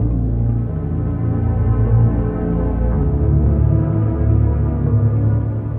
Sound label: strings odd, Sad; Loops